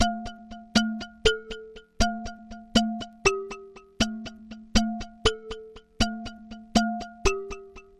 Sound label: Ambient House Percussion 120BPM